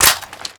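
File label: weapon foley tavor reload clip in; Call of Duty Modern Warfare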